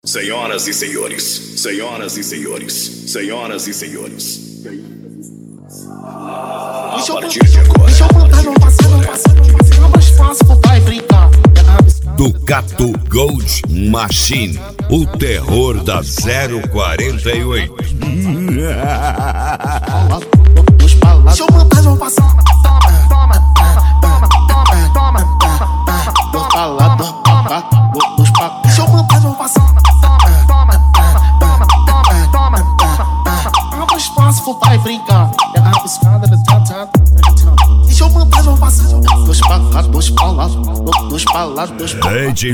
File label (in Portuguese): especial funk